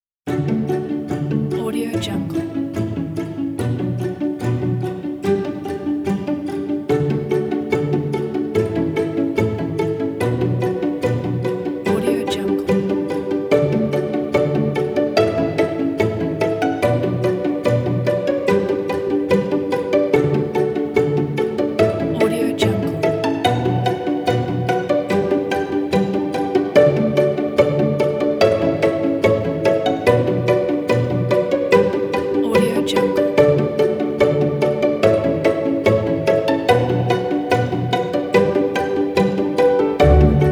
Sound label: inspiring storytelling pizzicato strings